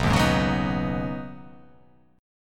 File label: B 6th Add 9th B down, chord